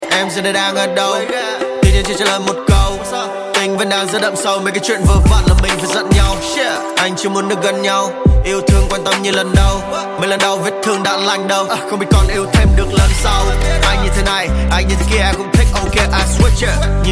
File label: rap